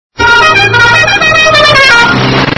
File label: Zepp Horn; Гудок диижабля